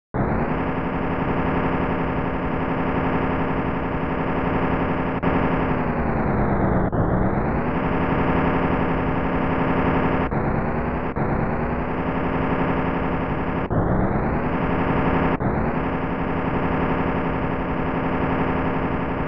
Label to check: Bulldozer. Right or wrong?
Right